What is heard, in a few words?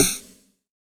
ELE SD, Drums Dance & Groove; USB Soundscan vol.11; AKAI; ELEC. KIT